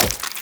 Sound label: Wall Jump Flesh; Warframe; Tenno; Wall Run